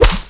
Bullet Body, Impacts

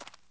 walk l, dirt foot; The Elder Scrolls III Morrowind GOTY; XBOX

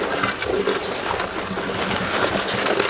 wood deck loop; XBOX; Amped 2

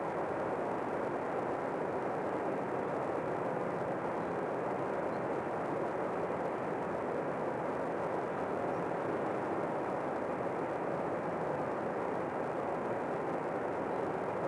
atrium ambience; theed; Star Wars Obi-Wan; XBOX

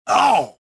pain; Star Wars Jedi Knight II Jedi Outcast; XBOX; lando

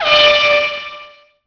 horn; Colin McRae Rally 3; XBOX

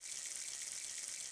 pee, dog; enemy; Soldier Of Fortune II Double Helix; XBOX

